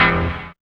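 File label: PIANO CHORD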